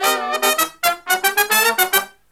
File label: Brass Riffs; USB Soundscan vol.29; AKAI